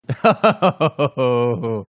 laughing oh

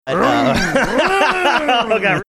making engine revving sounds

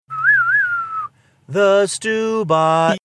whistling; the stubot

